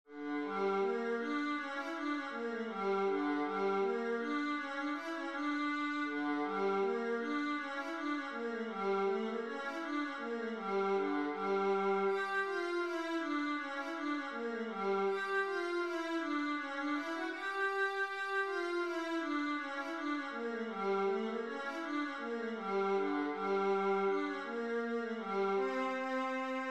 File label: hasapikos viola